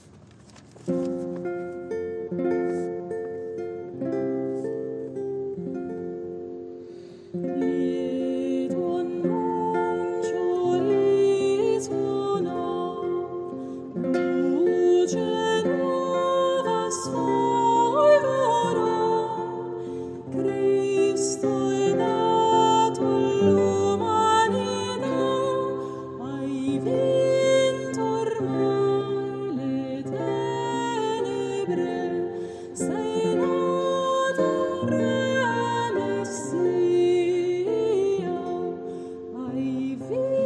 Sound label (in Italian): chitarra; Resonet in laudibus; Lieto annuncio